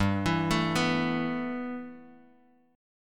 G 6th Add 9th, Guitar, Drop D

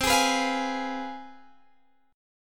C Major 7th Suspended 4th Sharp 5th

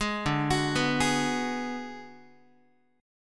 D 6th Flat 5th D down Guitar, chord; D6b5 arpeggiated; 11,10,9,9,12,x; tuning 4A,4E,5A,5D,5G#,6C#

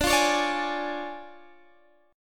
D Minor Major 7th Flat 5th D Guitar Drop D

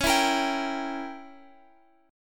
Db Diminished 7th Db down Guitar Open F; Dbdim7; strummed

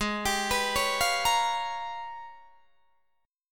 G sharp Minor Major 13th, Guitar DADGAD; G#mM13 arpeggiated

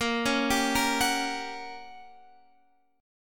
G Minor Major 7th Flat 5th, A sharp

